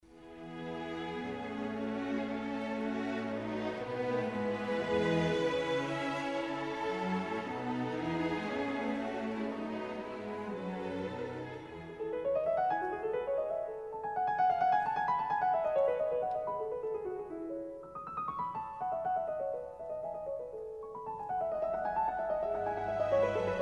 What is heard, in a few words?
piano concerto a major; mozart; k488